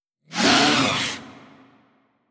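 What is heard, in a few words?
Animal Death voice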